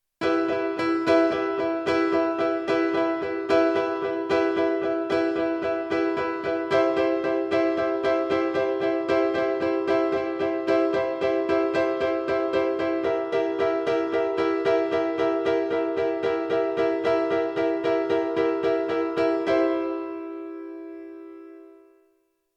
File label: Repeating Piano